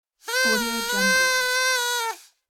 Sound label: party blower